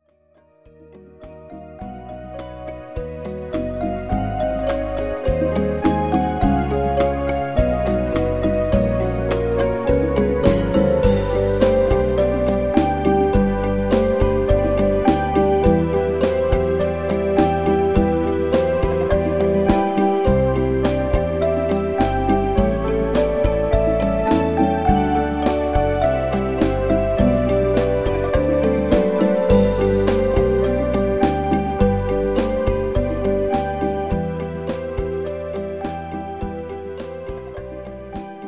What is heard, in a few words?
music box rumba